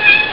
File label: miaow; neko dai suki